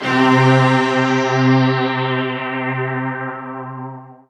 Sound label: SYN K-2000, Roland; Sound & Vision - Gigapack I CD 2